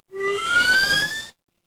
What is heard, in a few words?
magic scifi hologram